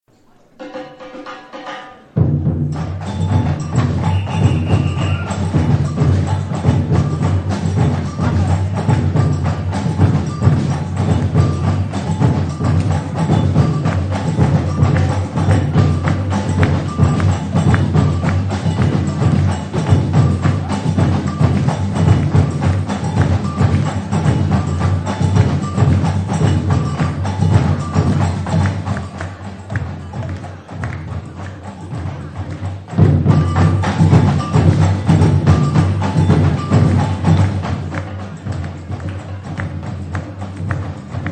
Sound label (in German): Hintergrundmusik